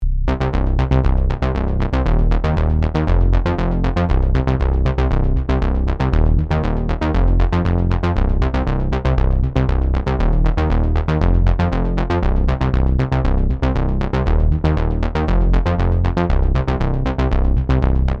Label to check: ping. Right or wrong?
Wrong